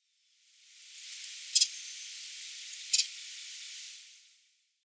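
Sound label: Ruby-crowned Kinglet; sakata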